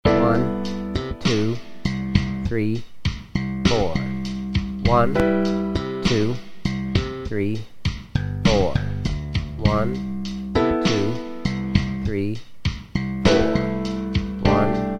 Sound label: Jam Track One Major Count